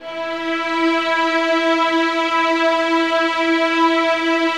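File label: 2nd violins sustain e4; Sonatina Symphonic Orchestra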